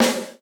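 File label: gated snare drum, Drums Acoustic; USB Soundscan vol.10; AKAI; 90s sample CD; GATEKIT 2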